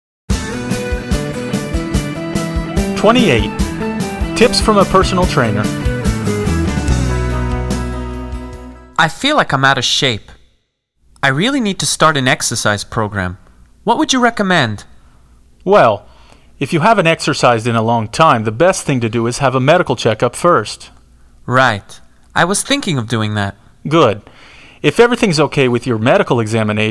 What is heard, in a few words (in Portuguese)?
Trilha